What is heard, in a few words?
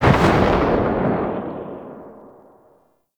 Revolver Rifle Tail, Weapons; Warframe; Tenno; Mod Quest